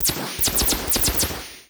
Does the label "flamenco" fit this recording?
No